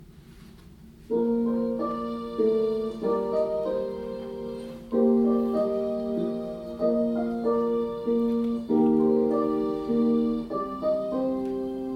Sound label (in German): Kyrie Sopran, Chor